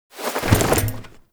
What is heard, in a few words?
APC Jump Off Back Left; Enemies; Lasrian; Warframe